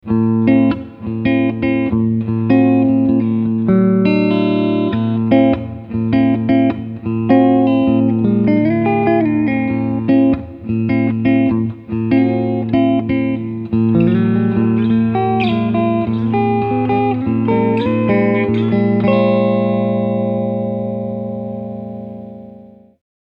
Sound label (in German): Casino Coupe beide P90; epiphone